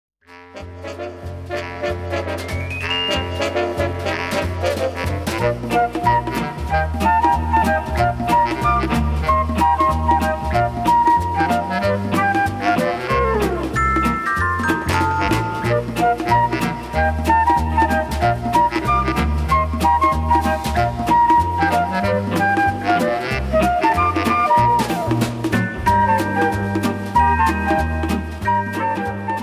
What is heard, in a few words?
My Flute